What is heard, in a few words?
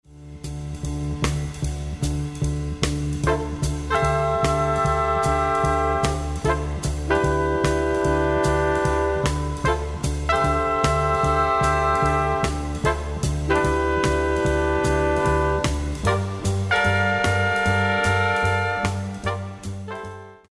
Traditional Jazz